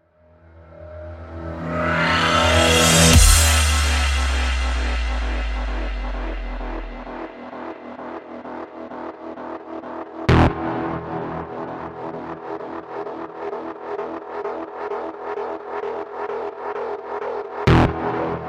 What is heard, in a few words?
Disco Track